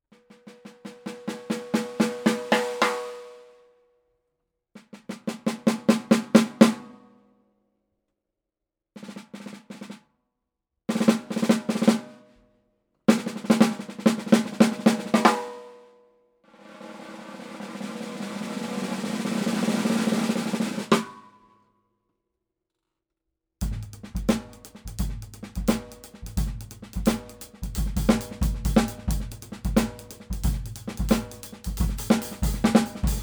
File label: Gretsch Drop G Snare; Gretschdropfg